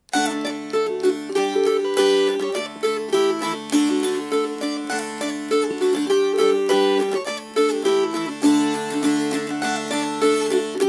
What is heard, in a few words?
Fast